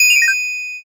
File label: ice magic